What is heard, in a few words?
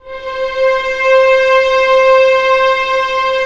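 STR C4 PP, Orchestra Strings; Sound On Sound - Peter Siedleczek's Orchestra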